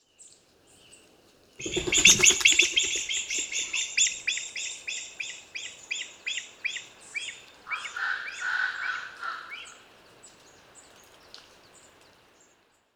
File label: Himalayan monal; پرنده کاکلپری هیمالیا